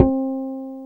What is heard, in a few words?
E-GT LO2